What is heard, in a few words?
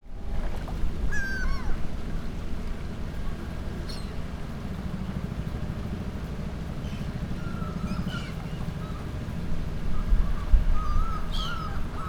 bird